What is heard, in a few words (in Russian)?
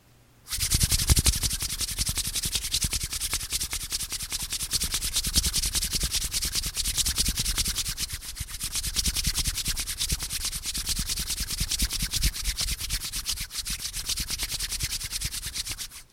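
трение рук друг об друга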